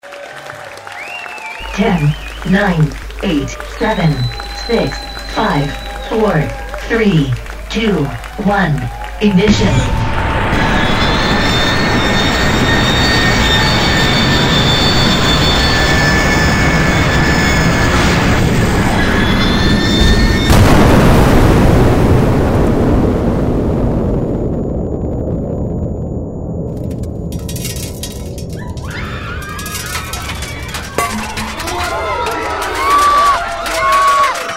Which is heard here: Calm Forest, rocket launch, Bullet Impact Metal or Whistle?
rocket launch